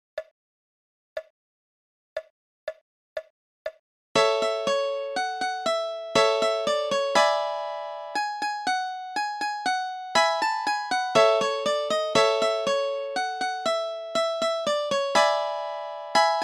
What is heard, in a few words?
Polka; Doudlebska